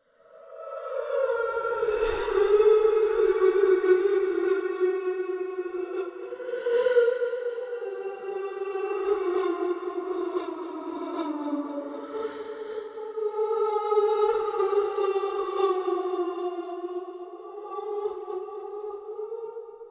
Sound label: weeping ghost woman creepy crying horror sound effect